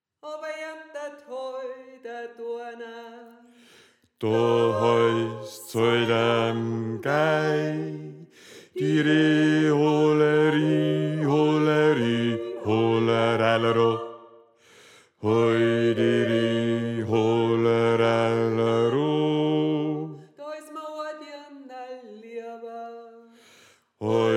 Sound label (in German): Bass